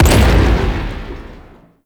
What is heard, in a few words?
Heavy Impact Mod Blast; Warframe; Mods